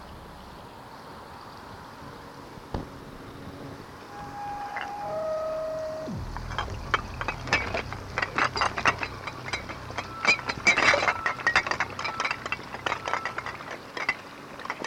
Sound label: lake bottles